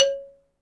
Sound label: XYLOPHONE, Percussion; ILIO